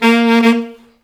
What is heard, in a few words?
SAX Alto STAB, Alto Section FX